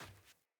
Sandals Run Dirt, Footsteps

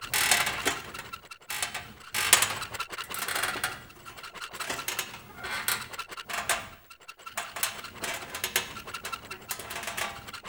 glyph target idle loop; Warframe; Duviri; Glyph Puzzle

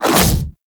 Infested Splitter Melee Swipe Small; Warframe; Grey Strain

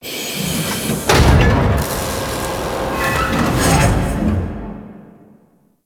Ship Metal Mech; Warframe; Corpus Ship Remaster

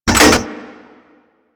Attack Osprey Flaps Open; Warframe; Corpus

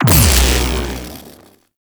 Mech Shield Mod; Warframe; Nechro Mech; Abilities

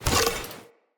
Player Torch Equip; Weapons; Blunt

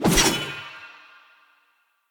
Long Sword Throw Perfect; Weapons; Player; Pothia Long Sword; Swords

